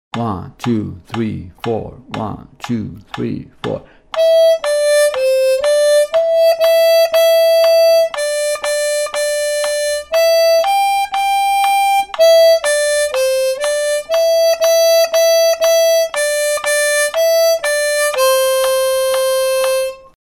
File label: Mary Had a Little Lamb 120